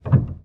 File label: piano release